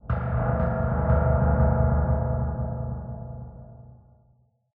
Atmospheric Mystery Metal Pulse; The Sinking City 2019 pc gamerip

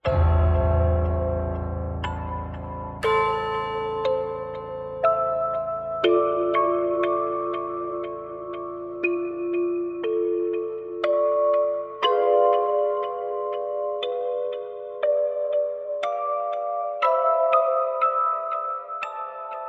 Gameover; Aria's Story

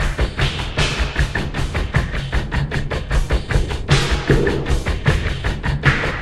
Lake beat

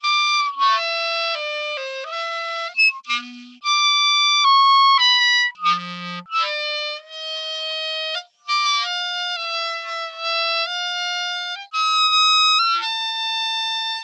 Horrible Clarinet Solo Loop

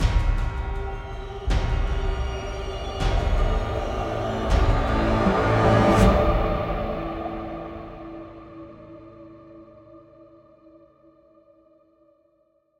Battle Intro; Hell Warders

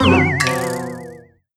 Oops...; paper mario the thousand year door complete

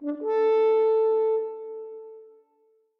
Complete; Medieval Kingdom Wars

